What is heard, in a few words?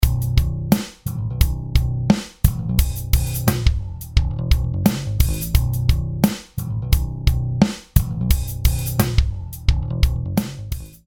Snare